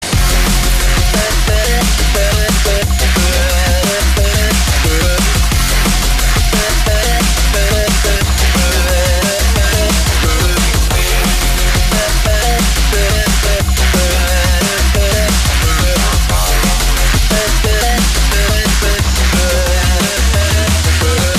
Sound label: rock